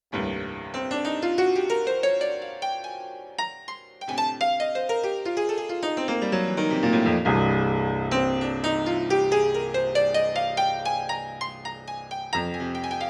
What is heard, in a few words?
61 Key Grand Low; Yamaha TX16W; Yamaha CFIII Grand Piano